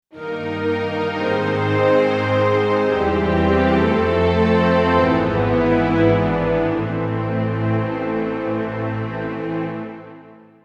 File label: Winds Horn Str